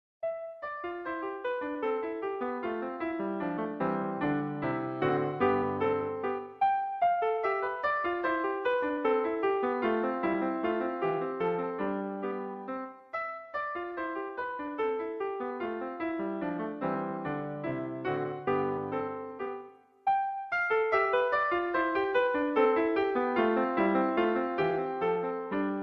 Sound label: Bells